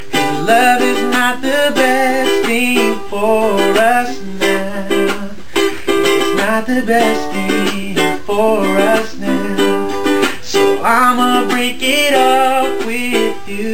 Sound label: break up song